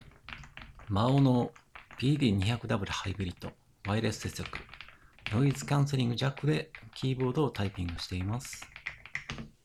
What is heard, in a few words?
maono pd200w hybrid Wireless typing sound